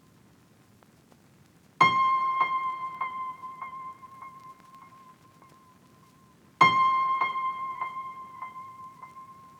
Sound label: West Coast Melody Loop - 100 BPM F Min Piano; Melodies; Loop Stems; Cymatics; Oracle Sample Pack